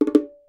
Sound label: battery countdown; Swapperoo; Android iOS mobile Switch Windows